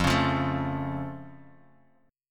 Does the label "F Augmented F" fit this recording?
Yes